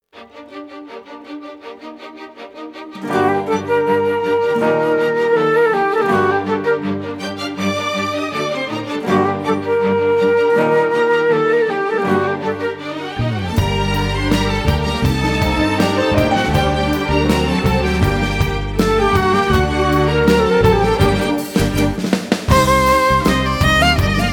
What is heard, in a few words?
instrumental, persian